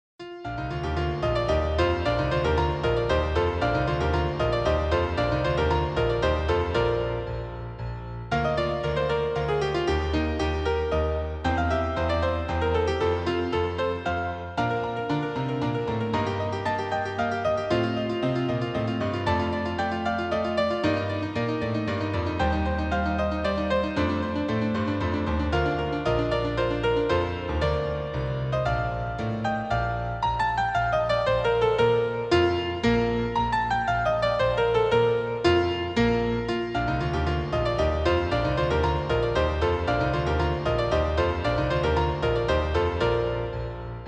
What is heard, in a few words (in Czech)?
Vivaldi koncert Bb dur; svatby